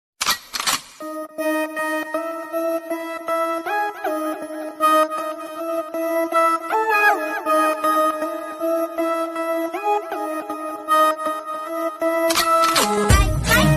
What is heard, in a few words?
BGM ringtone